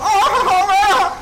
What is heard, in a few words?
ahahaueha